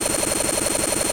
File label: Satellite Burst Loop, Sci Fi; 1001 Sound Effects